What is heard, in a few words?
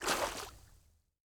Personnel Footsteps Water; DCS